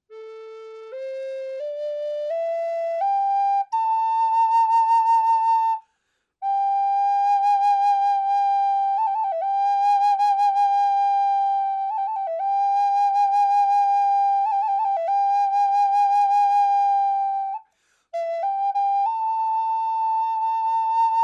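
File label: natural, flute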